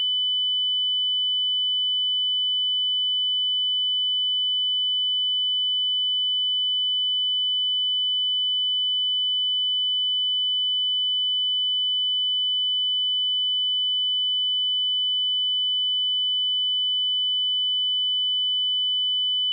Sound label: 2 kHz Sinus 20db; 3 kHz Sinus 20db; IPmotion Test Drive